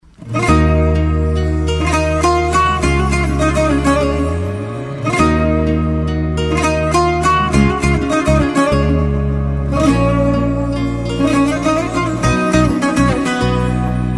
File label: ring gham, Sad Ringtone